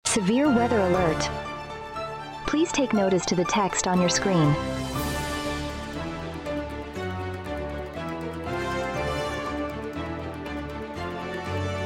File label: tone; alert